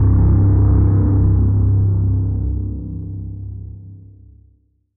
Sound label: Danger Flourish; Insanely Twisted Shadow Planet